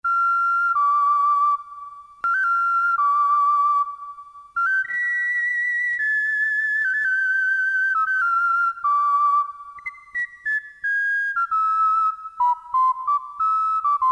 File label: Piccolo, Farfisa Syntorchestra Mono